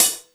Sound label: thin hat sample f sharp key; Mono; hat samples